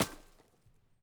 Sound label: lumber tree